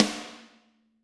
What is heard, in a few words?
Snare Crack